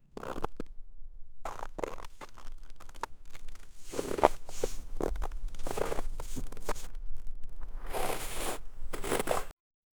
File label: deep snow impact burst; snow crunch